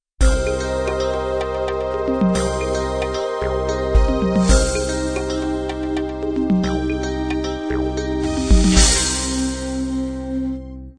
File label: 16 beat analog end